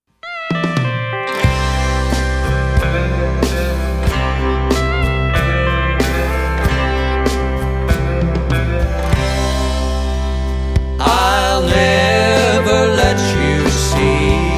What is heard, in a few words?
Country